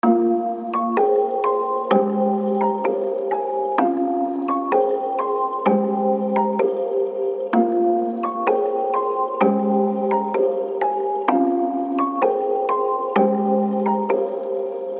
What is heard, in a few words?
Melody Loop 128 BPM F Min, Hip Hop; Cymatics - 9God Hip Hop Sample Pack